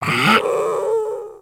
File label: Puppy Idle Yawn; Vocals; Warframe; Kubrow